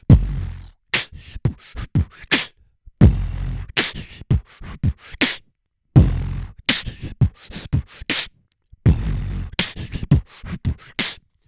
beatbox

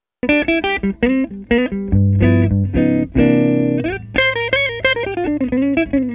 guitar; MIRtoolbox1.3.2

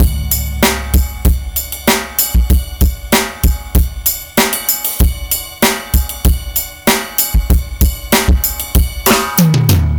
RnB Beat fuzz

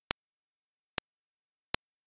3 clicks

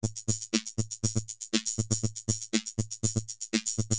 Analog Drum Machine robot

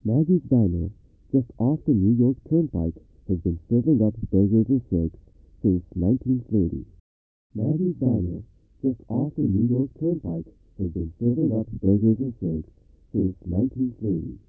low frequency band temporal smearing

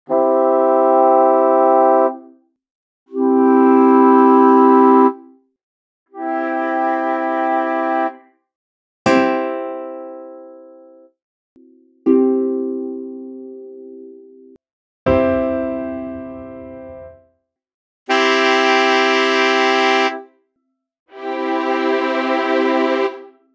Systematic Chord C Major Eight Instruments; MATLAB-Chroma-Toolbox 2.0

